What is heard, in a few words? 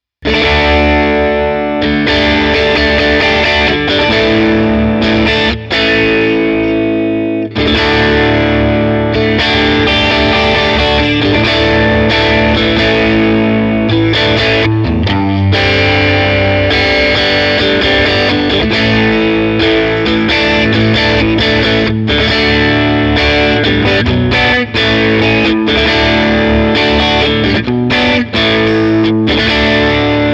Nux Trident Vox AC30